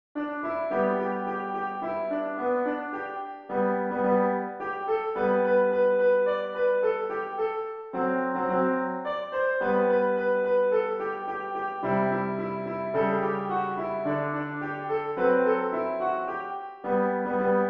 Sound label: shell be coming round the mountain piano